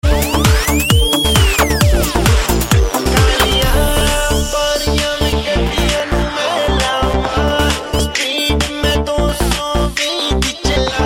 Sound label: Police Mix